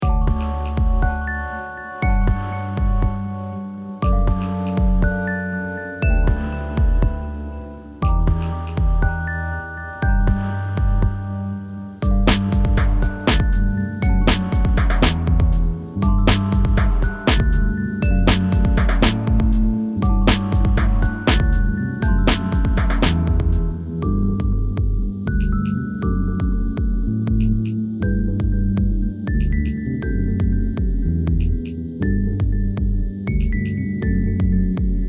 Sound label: upbeat electro pop song